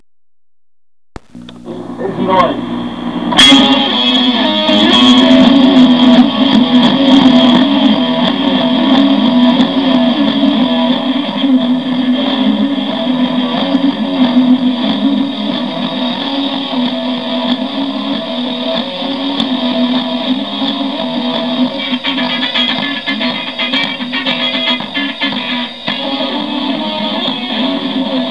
Instrumental

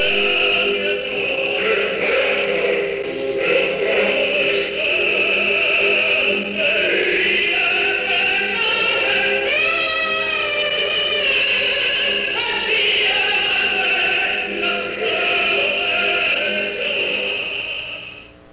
tenor

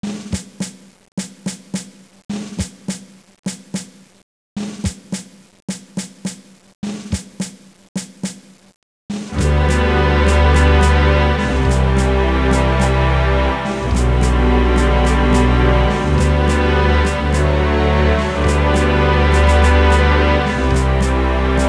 cry

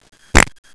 fart

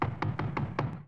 knock

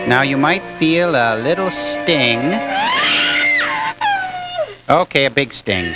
sting

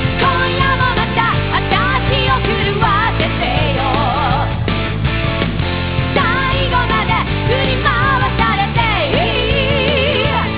guitar

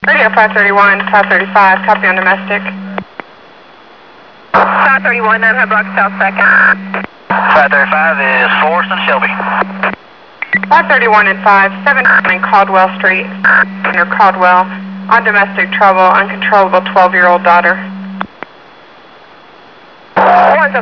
police

